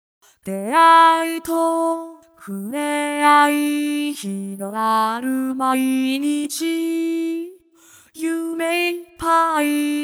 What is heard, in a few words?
vocaloid